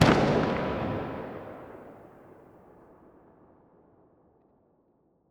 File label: m700 rev, weapons